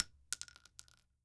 Bullet Shells Drop; Fairlight Sound Lib

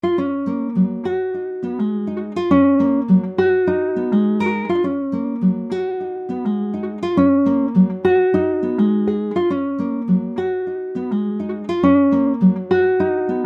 103 BPM F# Maj Guitar, Afrobeats; Melody; Loop Stems; Cymatics; Bahamas

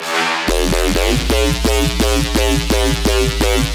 Bass House Loop 128 BPM E; Synths; Drop Loops

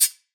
Organic Percussion Shot; Future Bass; Drums; One Shots

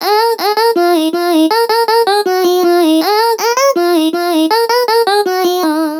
Vocal Loop 160 D#; Vocals; Advent Calendar 2019 Day 23 - Vocal Loops

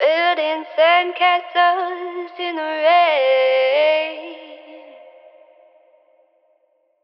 Phrase Wet - 80 BPM C Min, Vocals; Sandcastles; Cymatics - Eternity Sample Pack; Phrases

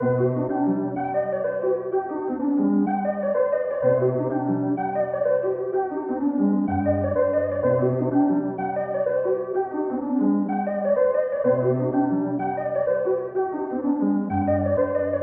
Dark Melody Loop 126 BPM B Min; Cymatics; 2020 Melody Collection

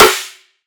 Snare; Cymatics; Future Bass Starter Pack; Drums - One Shots; Heater

